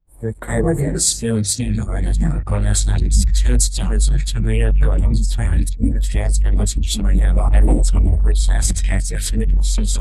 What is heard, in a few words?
expert introduction sound, acoustic